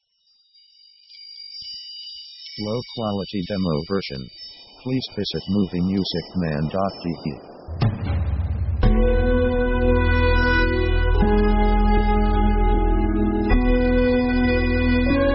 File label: Silent Night Jazz